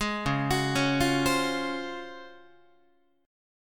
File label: Db Major 11th down Guitar; arpeggiated; JGuitar